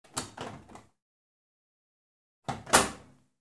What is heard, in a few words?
Opening and closing the washing machine door; laundry